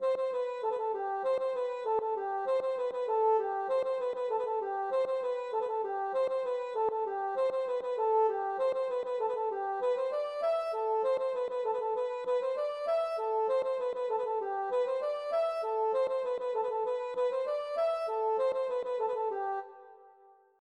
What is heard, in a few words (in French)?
ridee